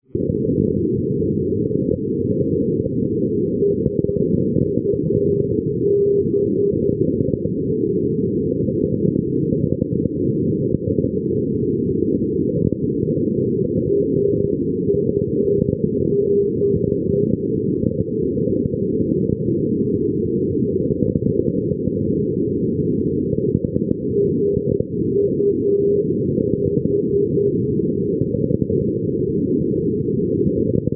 ndb aubenas aub 427; europe

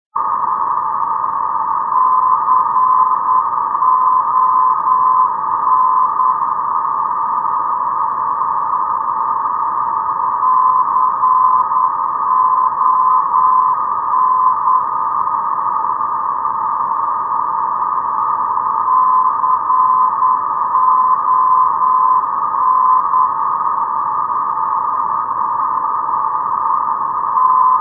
concepcion 405khz

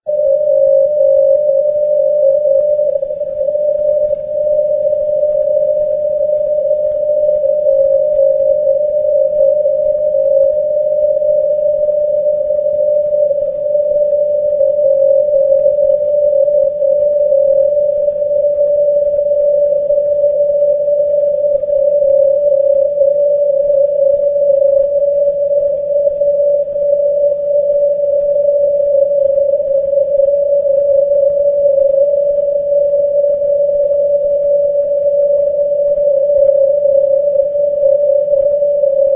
cw

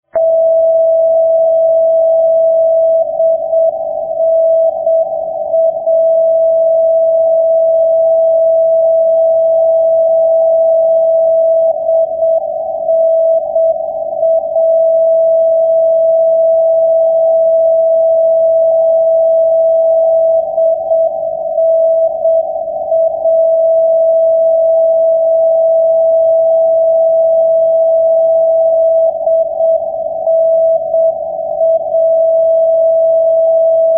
ndb jaromer; europe

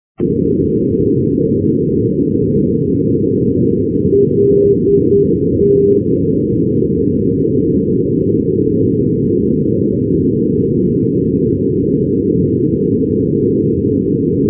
ndb le touquet lt 358; paris; europe

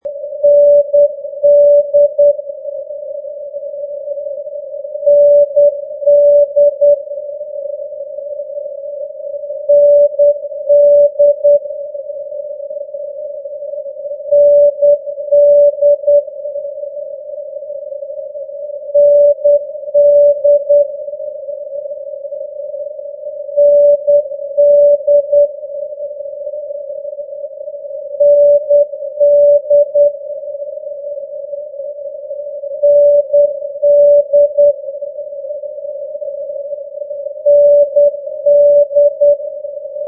ndb swidwin

